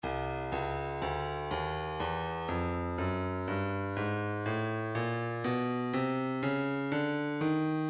breakbeat 061 bpm; Stylus Breakbeat Midi Files 060 064 Bpm; Lumpy F

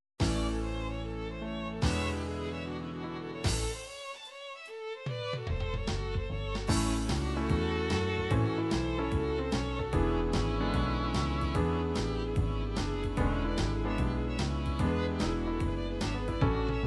country, midi

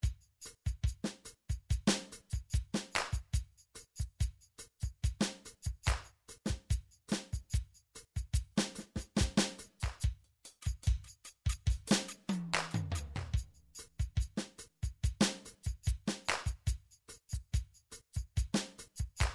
drum midi files

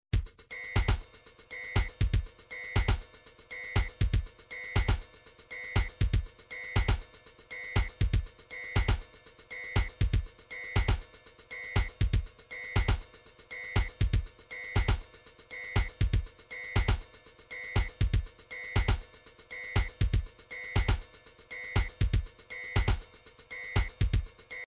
drums, midi drums

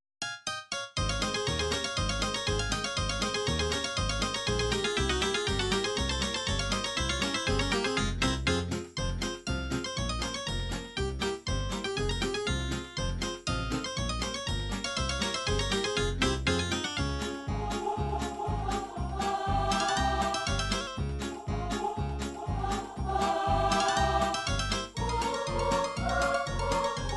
bulgarian, midi

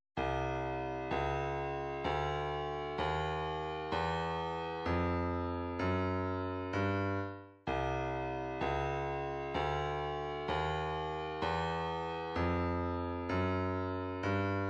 128 snare, midi

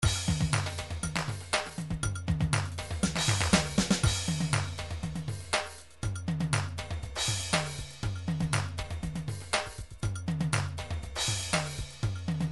drum grooves midi loops new age; Nice Beats 1000

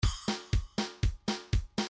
country 1bar

